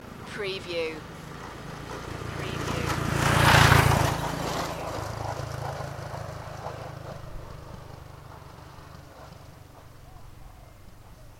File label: rusty motorbike passing